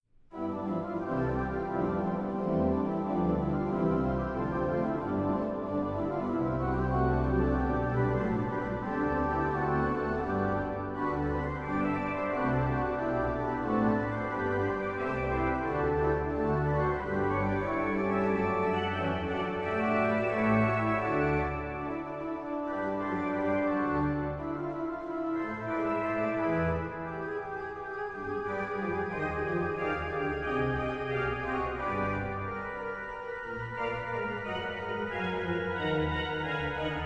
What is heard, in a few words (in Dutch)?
God is getrouw Orgelsolo